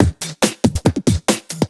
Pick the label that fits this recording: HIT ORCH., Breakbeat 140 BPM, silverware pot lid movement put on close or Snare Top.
Breakbeat 140 BPM